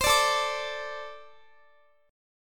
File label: A Minor Double Flat 5th, down, Guitar; Ambb5; strummed